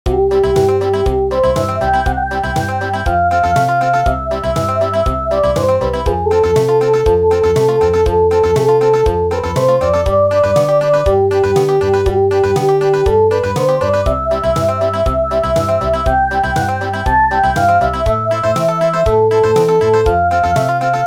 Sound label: Country, traditional; The Whisper Of West Wind